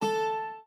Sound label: Ding